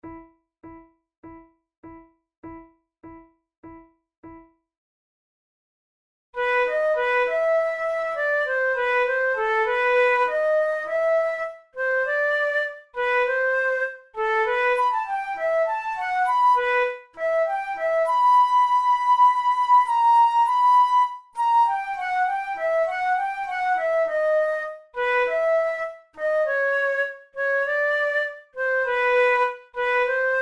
allegro; loeillet de gant op5 no6 iii

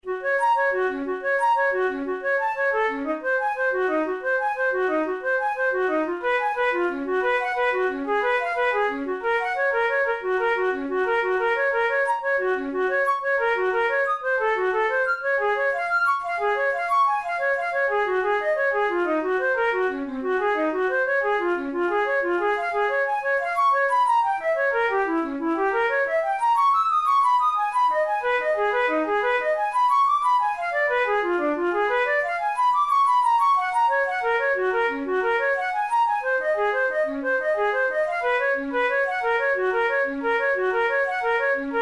caprice etudes, flute

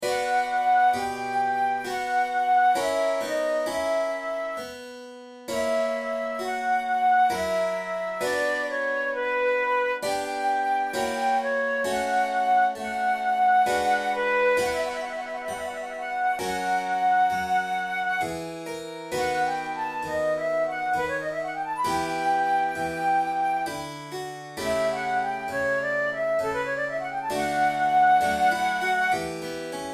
flute sonata in d major largo; vinci

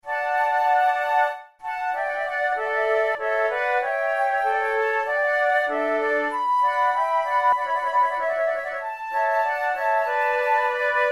sonate en trio, gravement, flute

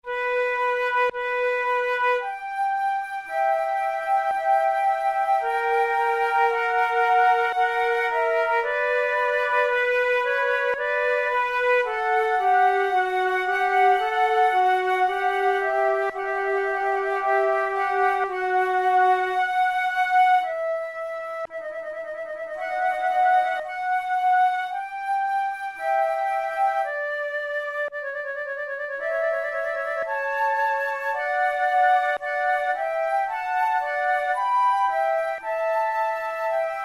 largo, flute tunes; loeillet de gant op5 no1 iv

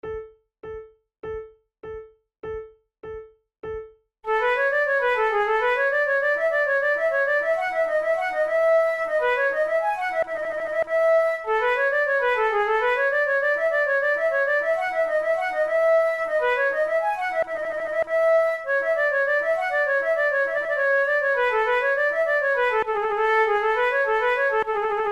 concerts for two flutes; monteclair; badinage

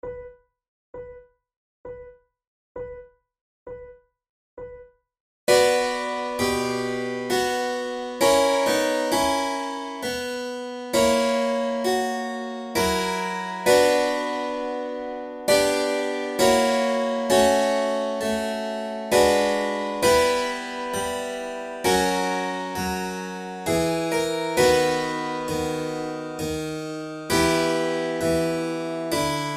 flute sonata in d major largo; vinci

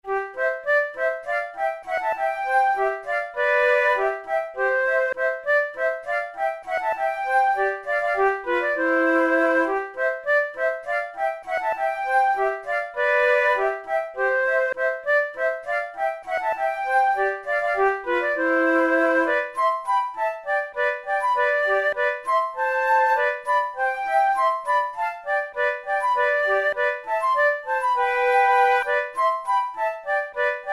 waltz duet, flute; philadelphia hop

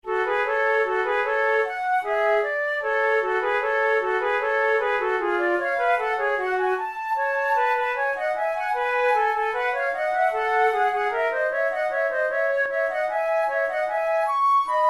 sonatas for two flutes andante

